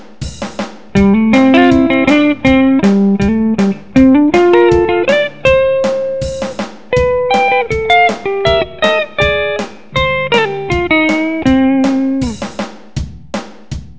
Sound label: cntry room